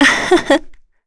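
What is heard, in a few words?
Laugh, Korean; voices; heroes; Yuria; kings raid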